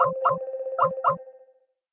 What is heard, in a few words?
CALL RECONNECT; FRONT; skype